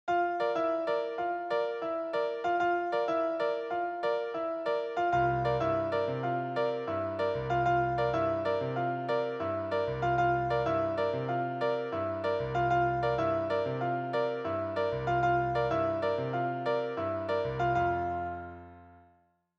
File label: Latin Vamp